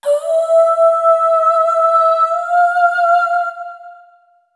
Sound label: Single Voice Melodic Loops, Vocal Samples; Pocket Diva World Class Vocal Samples